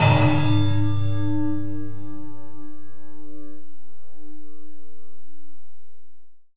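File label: buzz ambience; ENVIRN-AMB; Rarefaction; Poke in The Ear With A Sharp Stick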